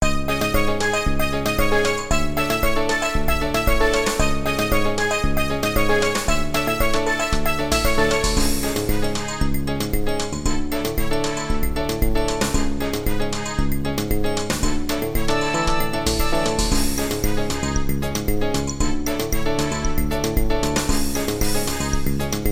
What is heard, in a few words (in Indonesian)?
Nada Dering Nokia Jadul Samba